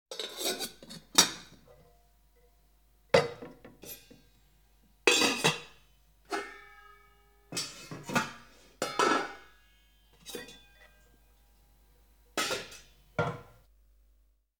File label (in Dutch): Gerammel Potten En Pannen; KMP Film Video Sound Effects